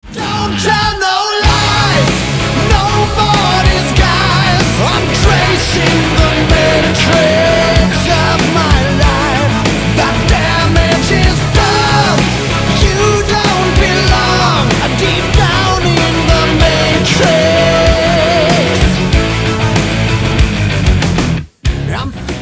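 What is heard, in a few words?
Chromatic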